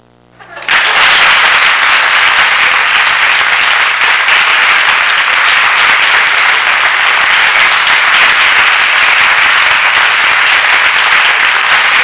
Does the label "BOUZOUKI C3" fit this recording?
No